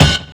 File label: HIT, 124 BPM, Drum & Bass; Ueberschall; Drum & Bass Flash Trackz